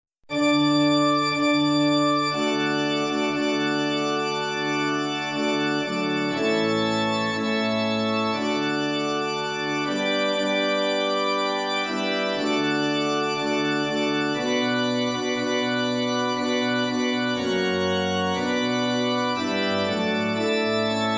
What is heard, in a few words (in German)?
D Dur Church Organ